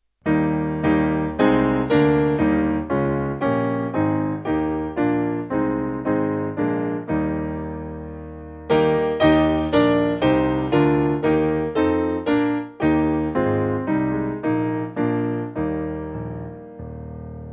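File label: Piano, church music backing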